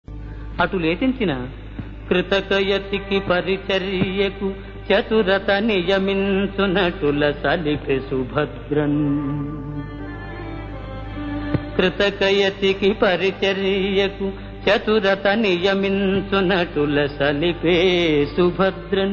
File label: Padyam; JayaSimha